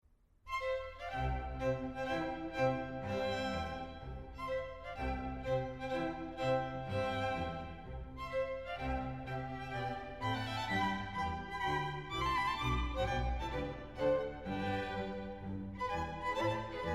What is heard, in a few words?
Wedding March; Figaro